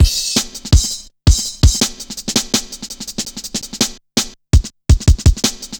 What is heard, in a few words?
Virtual, Drum Loops, 165.5, Jungle; Zero-G Jungle Warfare 1, 2 & 3